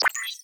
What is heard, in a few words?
Droid Spawn Beep